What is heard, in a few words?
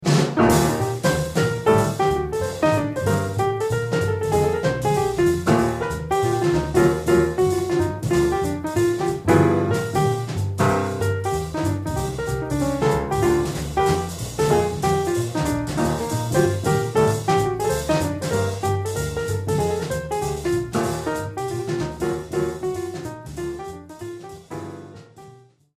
trio quintet